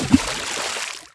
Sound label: Splash